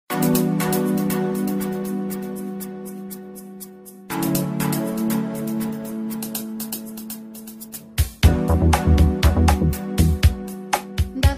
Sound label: latin classic mood ringtone